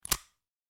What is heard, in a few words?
KEYLOCK; Spreadtrum